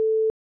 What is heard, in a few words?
call waiting tone ansi; i OS; iOS10; nano